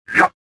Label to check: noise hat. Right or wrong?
Wrong